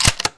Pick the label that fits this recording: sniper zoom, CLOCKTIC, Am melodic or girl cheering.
sniper zoom